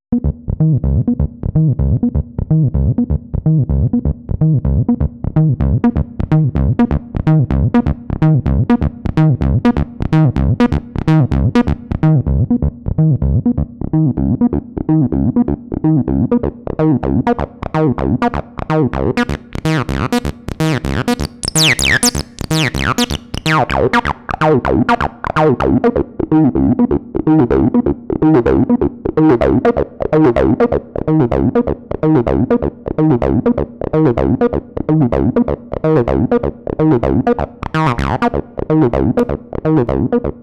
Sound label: VB303 test; kvr